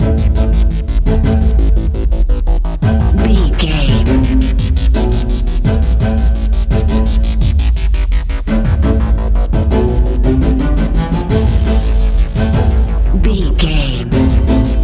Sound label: days thunder alt, A huge thunderous piece of action music. A powerful piece of epic action music with elements of dramatic power, full on